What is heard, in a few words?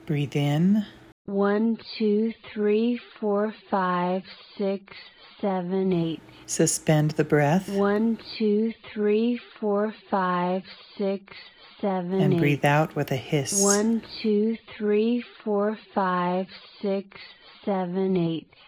breath extension